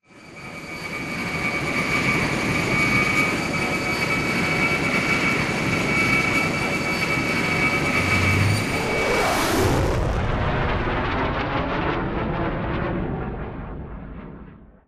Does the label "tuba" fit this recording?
No